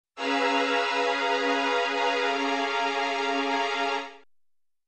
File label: Atonal horror vintage movie tension sound